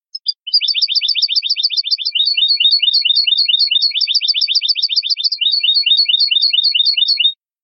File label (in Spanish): discordantes